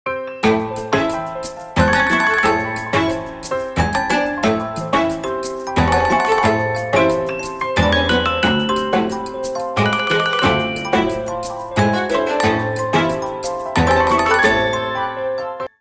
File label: Motorola Moto E22s Original Ringtone